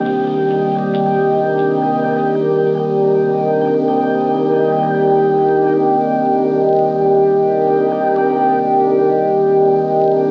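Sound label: pad loop beauty organ 150 C minor; Virtual Riot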